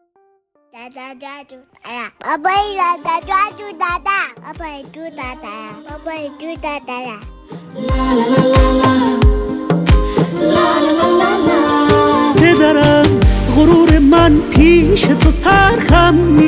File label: happy birthday dadi